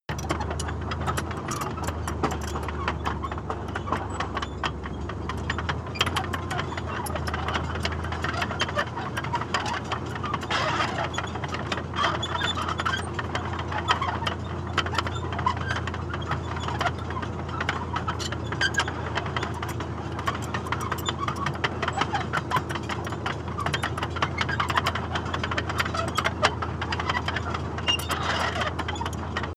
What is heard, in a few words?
tank large tracks rattle slow